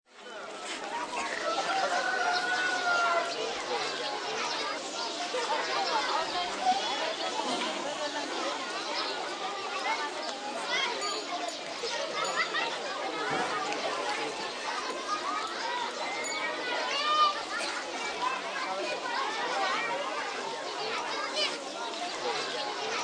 Ambience Village, Outdoor Village, Indonesia, busy street, 30+ children playing, laughing; talking, screaming, some adults, some nature sounds